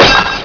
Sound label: small Glass; Scripts & Backflips